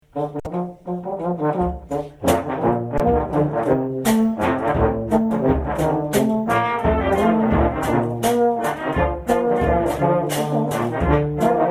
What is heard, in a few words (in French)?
Petite Mazurka